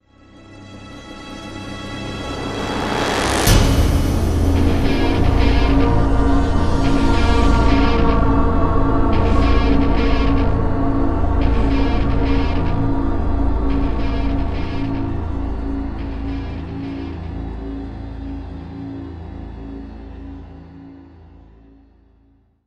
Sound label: POWER GUITAR